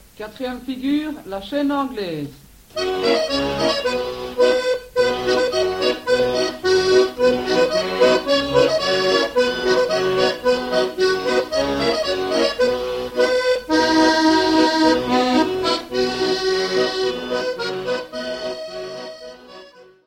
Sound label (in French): Quadrille, Belgique; Figure 4